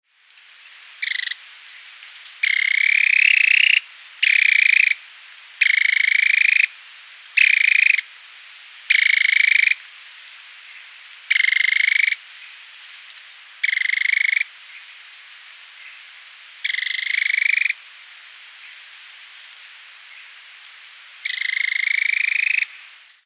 Capsiempis flaveola

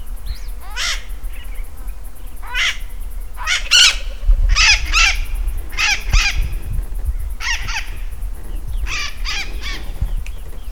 Aratinga acuticaudata

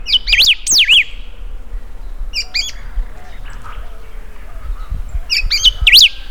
Saltator aurantiirostris

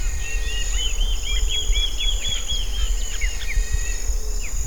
Mackenziaena leachii

